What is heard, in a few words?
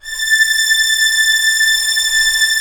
STR A4 FF, Orchestra; Sound On Sound; Peter Siedleczek's Orchestra